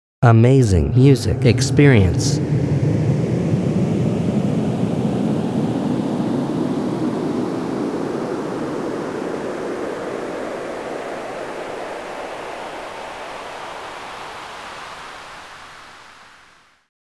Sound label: pitched vocal; quikquak; rayspace